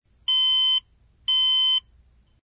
Alarm Clock; BLU Studio-XL-2; alarms

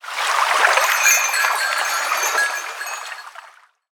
Shell Bells

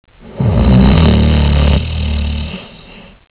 duck snore; Nokia; 5800 XpressMusic; Bounce Touch

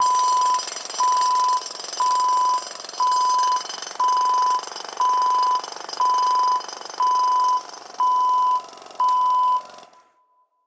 construction zone, ringtones; Google; Google Sounds; Play It Loud

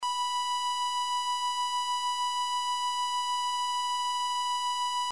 1KHz 0db LR; LG GW820 eXpo

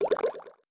soda touchdown; LG G4

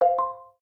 Peanut, notifications; Samsung; Galaxy J3 Prime

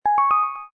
Low Beep Once

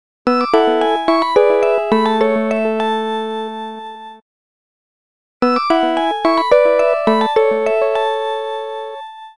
Grande Valse; Nokia; Beatnik

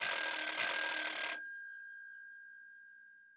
Old Phone, ringtones; Vestel; Venus

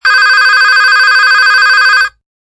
Burble, Rings; Danger Hiptop-LX-2009

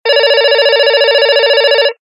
Traditional, ringtones; Lenovo; A6000

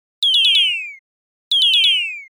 80s Arcade laser; Nokia

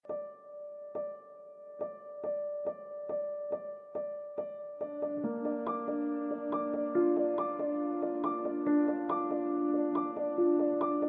Ding, alarms; Infinix NOTE 30

